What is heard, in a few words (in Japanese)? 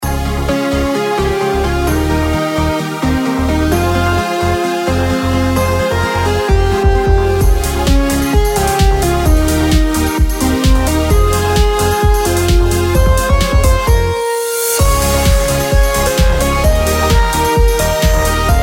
インスト